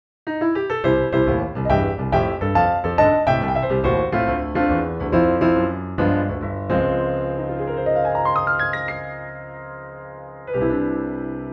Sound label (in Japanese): 奏法例 ジャズ系, piano